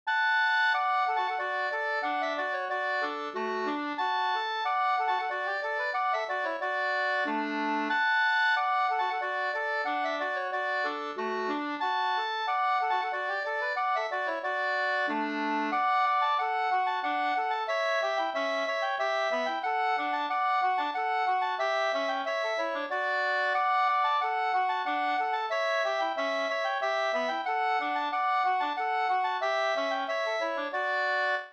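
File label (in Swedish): slangpolska, two-part; Torna Hallestad Sk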